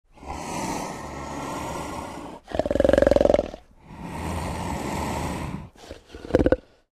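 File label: leopard voice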